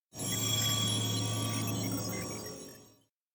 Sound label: elec outline blur; awayuki; anemoi